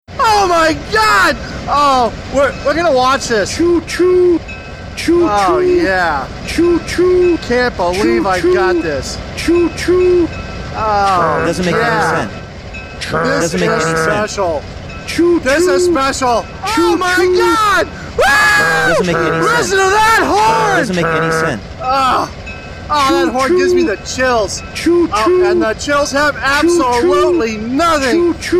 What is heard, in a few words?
train horn sounds; foamer